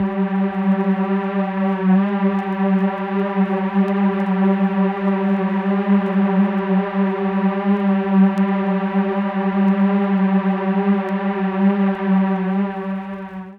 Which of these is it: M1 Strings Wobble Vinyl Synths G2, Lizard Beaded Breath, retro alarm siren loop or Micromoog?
M1 Strings Wobble Vinyl Synths G2